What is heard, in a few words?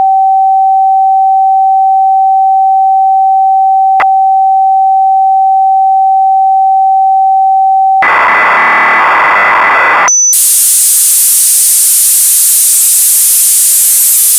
RINGTONE